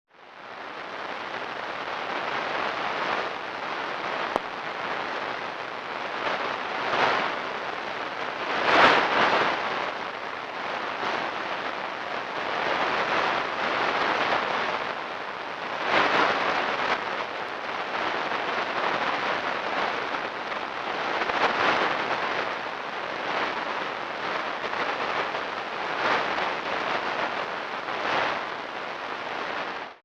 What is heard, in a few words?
spec 21MHz